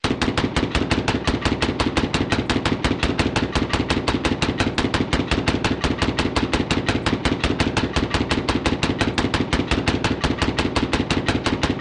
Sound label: Machine Gun Rapid Burst; bursts